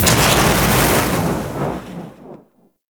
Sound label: Eruption Radial Fire; Warframe; Sargas Ruk